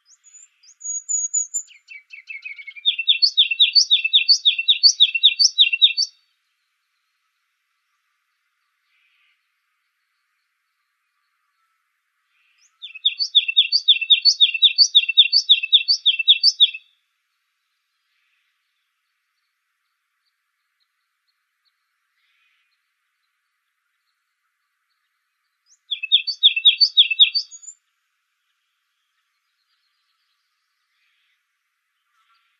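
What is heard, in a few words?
Ruby-crowned Kinglet, Wrens Kinglets Gnatcatchers; RCKI; deviche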